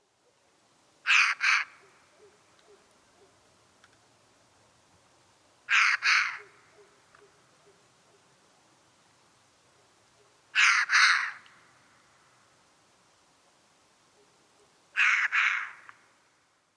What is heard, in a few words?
gargla, balsis; a.celmins